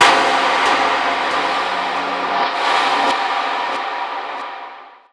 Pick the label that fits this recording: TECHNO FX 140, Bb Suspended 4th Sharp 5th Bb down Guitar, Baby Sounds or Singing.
TECHNO FX 140